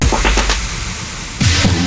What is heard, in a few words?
Drum Fills, 128 BPM